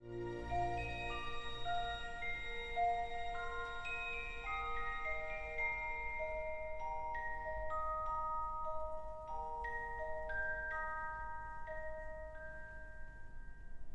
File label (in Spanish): CAJA DE MUSICA